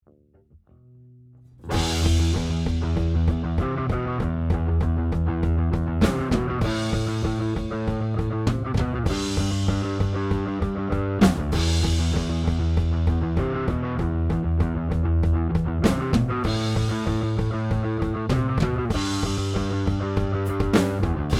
Drums and Bass Post Bass Comp

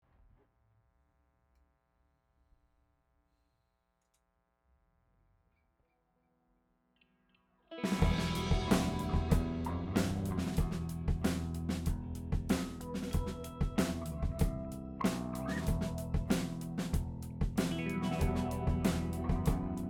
Drum Stems

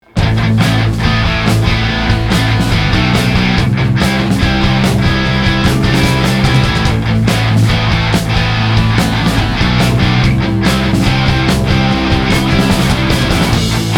Your Heart w: mike guitar

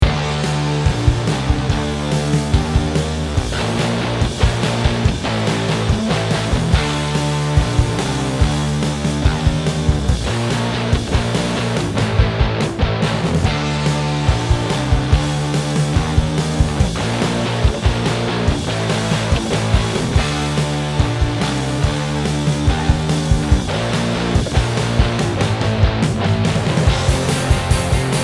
143 bpm D

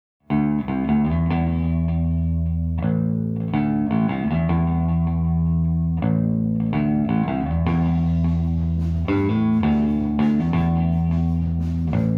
SM57 Bass

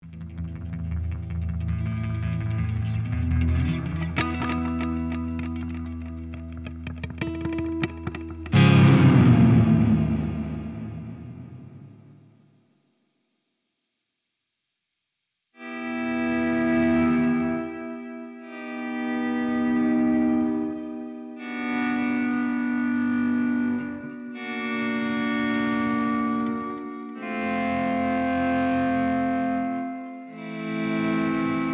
In Your Mind - Guitar Only

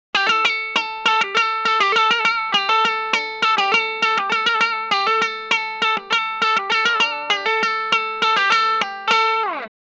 OPEN CHORDS - Tele Vox AC4TV

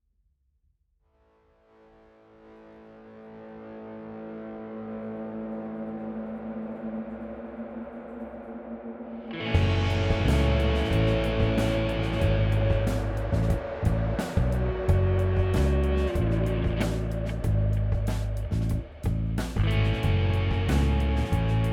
w Guitars

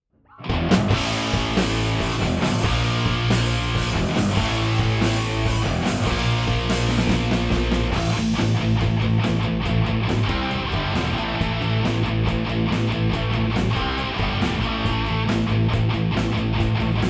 w Guitars

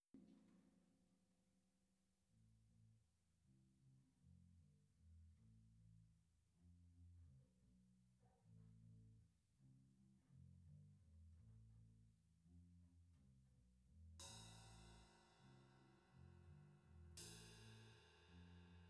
PZM omni drums

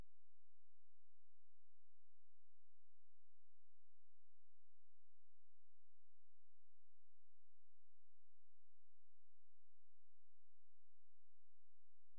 Toms Mix, Drums

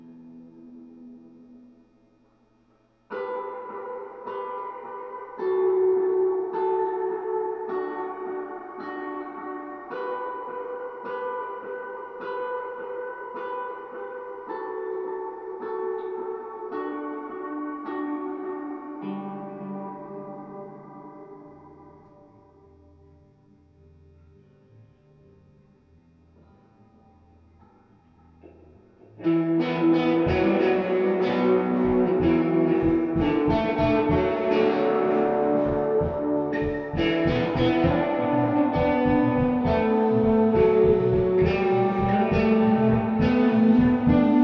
Rode NT1A Guitar (room)

